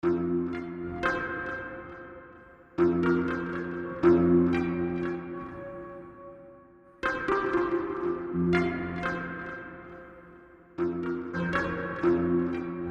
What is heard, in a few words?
Pluck